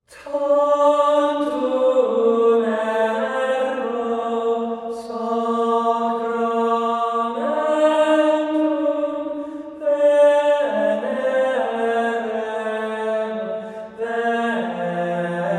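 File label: top alone with REVERB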